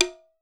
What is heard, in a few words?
WOOD TAMB; BRAZIL SET; Voice Crystal - Steve Reid Definitive Percussion